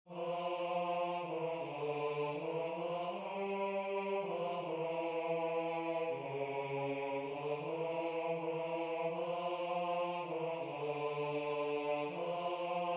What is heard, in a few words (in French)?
Hommes